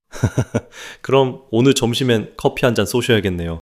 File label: laughter